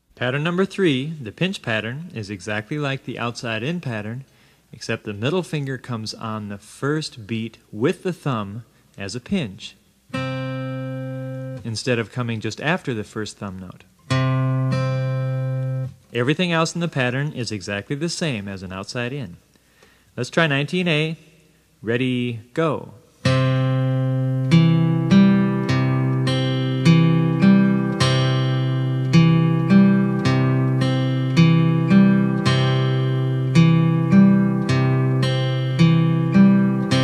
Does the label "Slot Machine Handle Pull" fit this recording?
No